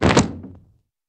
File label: Rug Shook Out; Hollywoodedge